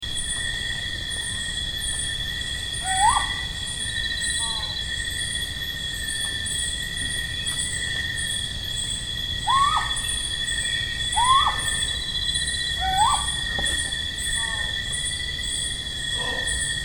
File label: Owl Kitanglad